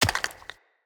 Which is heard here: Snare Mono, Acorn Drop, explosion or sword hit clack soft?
Acorn Drop